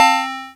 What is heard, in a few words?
chord